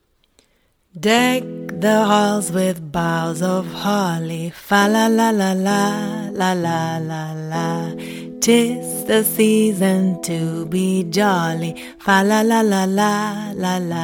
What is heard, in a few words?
Deck the hall vocal sample VO; LOFT VOICES